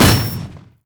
rumble carpet bomb

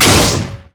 basic attack; lucian base

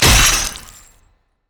shackle hook; LoL; thresh

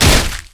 basic attacks; LoL; vi

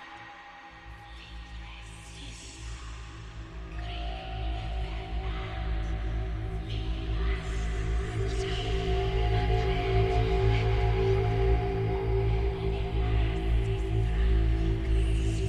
player death loop; LoL; howling abyss

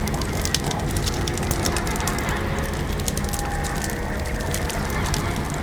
green fire

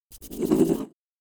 wolf bored; LoL; monsters

